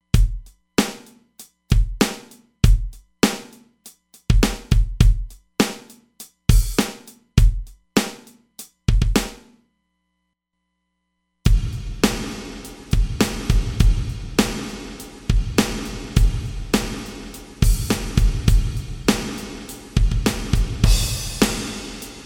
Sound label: Plate, Roland DEP-5; Factory Patches